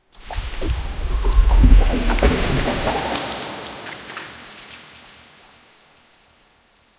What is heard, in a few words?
long psychedelic fall of, cave